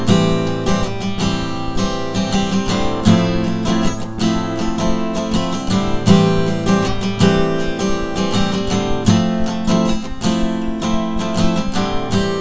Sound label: am7 fmaj7